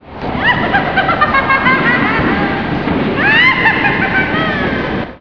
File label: woman evil laugh